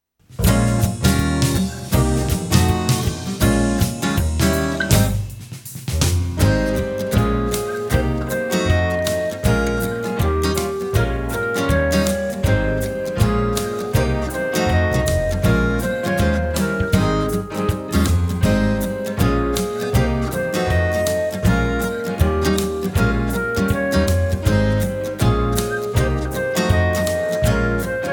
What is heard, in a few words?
Skip to My Lou Instrumental